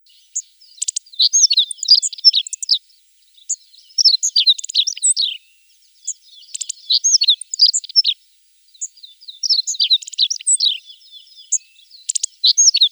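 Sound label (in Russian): Ovsjanka krasnouhaja, Meadow Bunting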